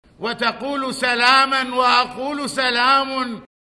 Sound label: loop